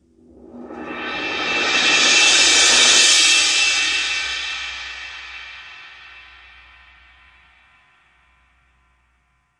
orchestral crash swell

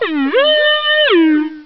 Alert Siren

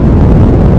thrust, video games; starcas

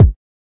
kik

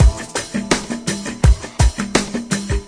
patient lush, 083 bpm, drum loop

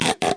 washboard frog; Weird and Interesting Hits; single hits

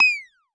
scream, 8 Bit Videogame; LO FI and 8 BIT KITS

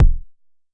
Jomox MBase, drum machine; Jomox MBase 01

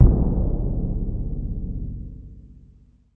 timpani lo; Western and Latin Percussion; single hits

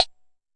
Jomox MBrane, drum machines; Jomox MBrane 11

